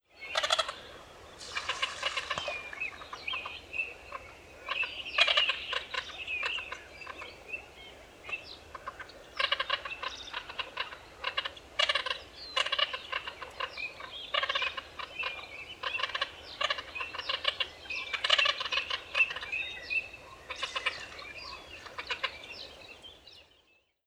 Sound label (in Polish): cyraneczka zwyczajna anas crecca